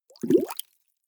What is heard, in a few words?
bubbles medium